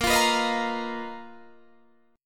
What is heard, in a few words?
B Major 7th Flat 5th A sharp, chord shape